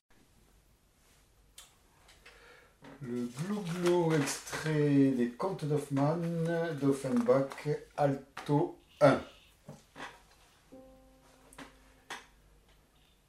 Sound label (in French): glouglou alti